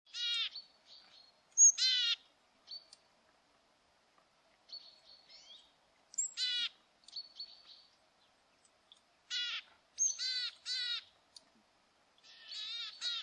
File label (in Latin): parus montanus; Przyroda ptaki i zwierzęta